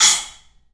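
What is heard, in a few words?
CLUB CYMBALS; 3D Sound; 3D Drums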